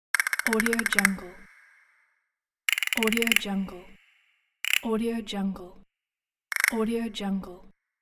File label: castanets